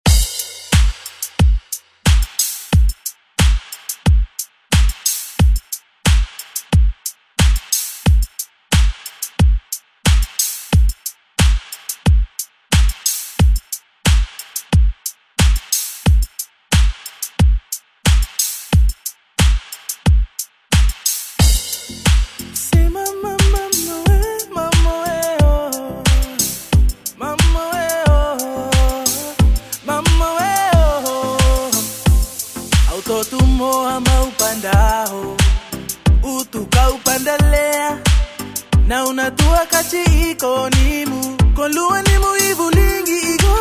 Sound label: Re Drum